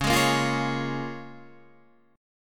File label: C sharp 6th C sharp down